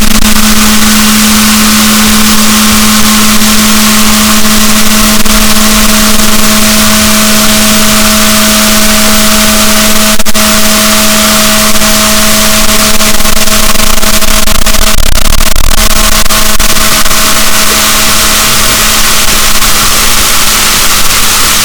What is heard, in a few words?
rorquals negatif, boat